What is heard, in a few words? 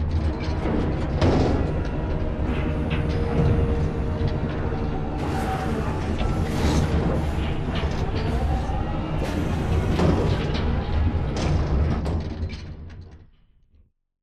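dog whistle idle mech medium distance; boneyard; Titanfall 2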